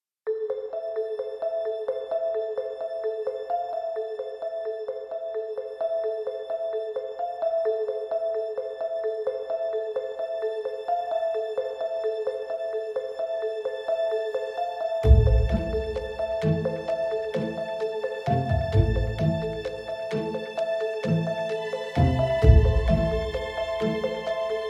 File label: Tension Light